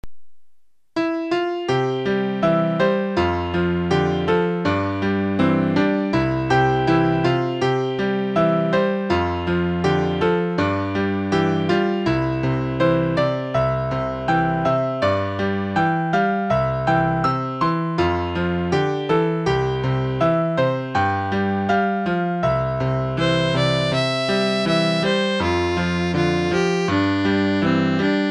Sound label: Piano Trio